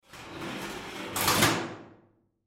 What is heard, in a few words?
Door Close Metal Sliding Tool Locker; Doors; Foley; Blastwave